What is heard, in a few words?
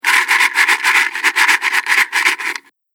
Shaking A Box Of Matches; Shaking Sound Effects; Foley Sound Effect; Andrew Fleming